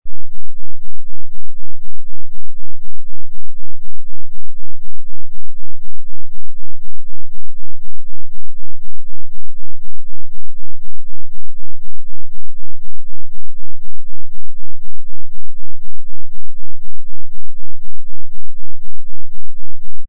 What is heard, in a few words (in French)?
2 Hz, Fréquence Fixe de 2 Hz; 2Hrz 20s; tester de façon progressive!; INFRA GRAVES - Pour observer l'excurtion des HP